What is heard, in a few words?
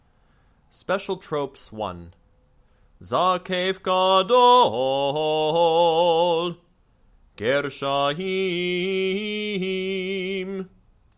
Haftarah Trope Special Tropes